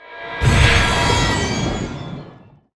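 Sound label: ManaBurn Explosion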